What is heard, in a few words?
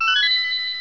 get key; Open Zelda 6.2.4; Quest Designer 2.0.4